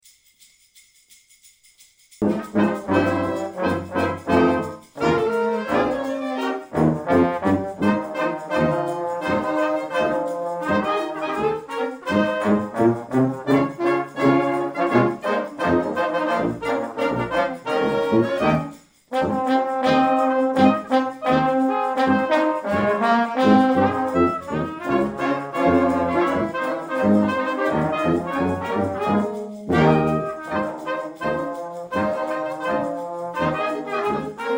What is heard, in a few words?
Jingle Bells